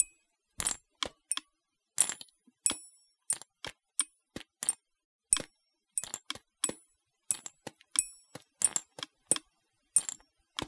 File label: perc loop